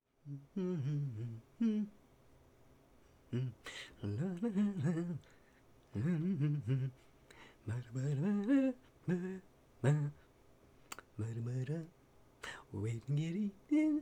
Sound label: Male Idle